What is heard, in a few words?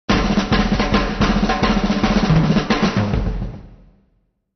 fills jazz